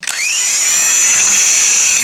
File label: small electric motor spinning up and down